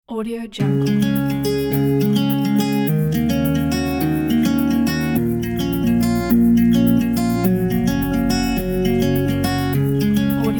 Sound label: guitar acoustic